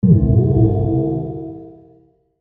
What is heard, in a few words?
tonal warble deep; gamerip; The Warlock of Firetop Mountain